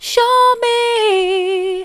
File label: show me soft, vocal